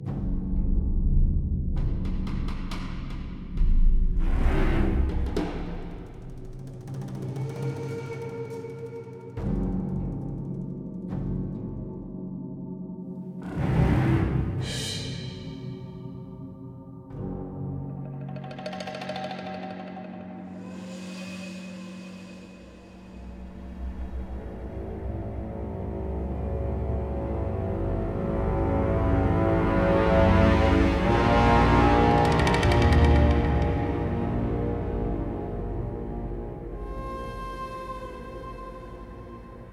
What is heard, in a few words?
Fight Theme Ambient; ryse son of rome 2014